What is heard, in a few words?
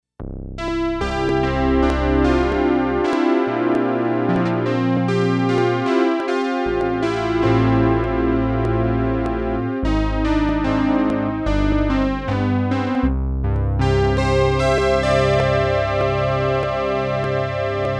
Edge Piano